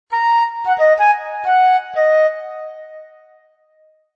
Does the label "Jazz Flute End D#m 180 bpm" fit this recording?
Yes